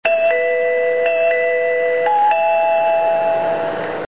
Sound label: bus chime; 遠州鉄道; チャイム１は空港直行バス『e-wing』および高速バス『e-LineR』のチャイムです。